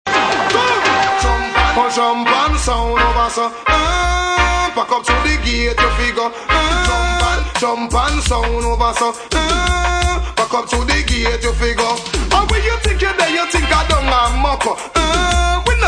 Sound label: drum pan